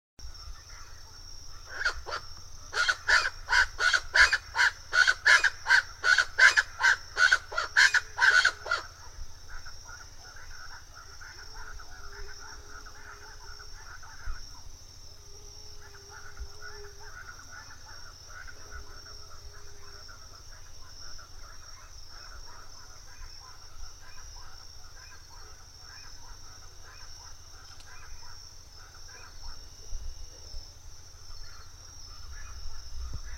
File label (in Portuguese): canto do aracua de sobrancelhas